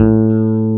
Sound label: N BASS A2 ON; AKAI S6000 CD-ROM - Volume 1